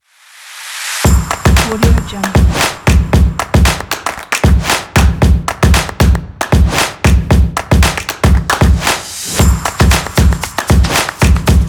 stomp promo kit

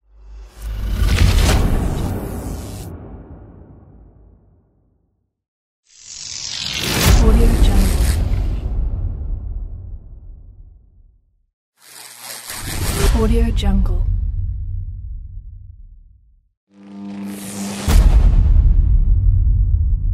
electric circuit whoosh hit sfx pack

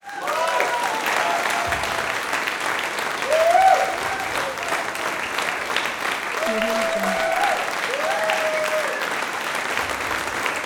applause

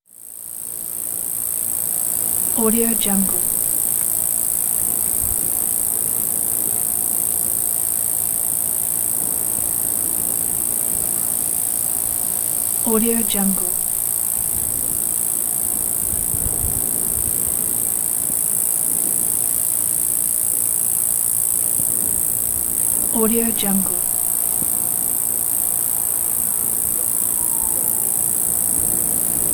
cicadas

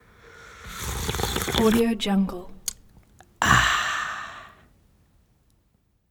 drink

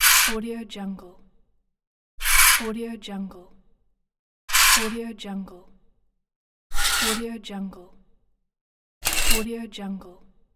curtain

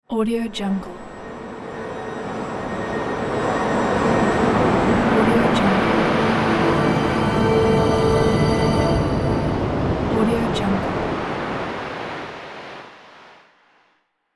dark world of magic

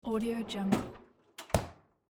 refrigerator drawer open

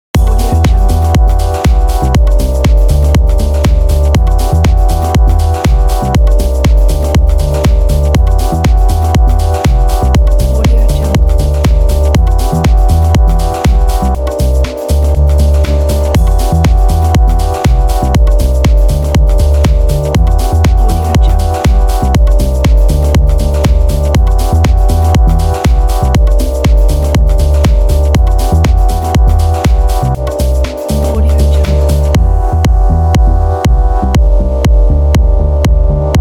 fashion house loop; mehilovesound

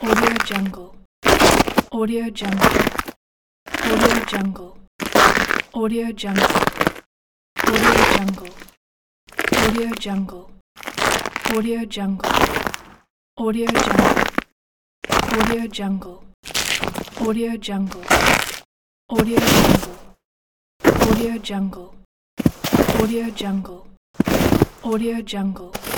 snow steps

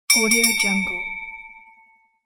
captain boat bell

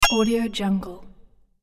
game coin collect

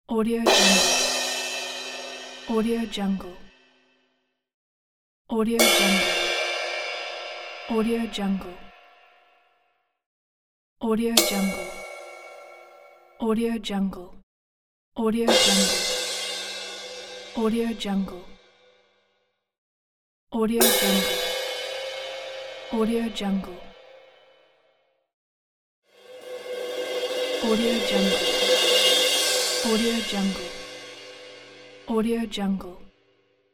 cymbal sounds pack

